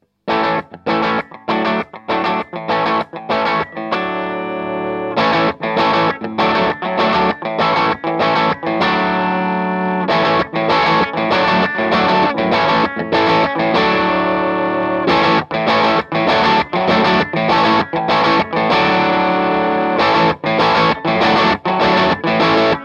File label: celestion g12h; budda sd30